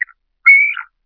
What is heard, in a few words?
bird